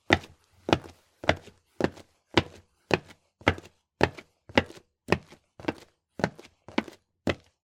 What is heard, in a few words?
Footsteps Male - Boots Concrete Regular